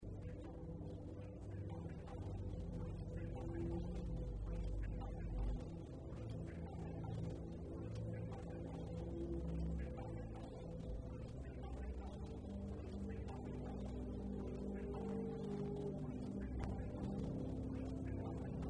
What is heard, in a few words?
In Water